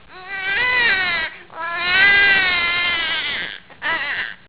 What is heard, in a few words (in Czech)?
placici dite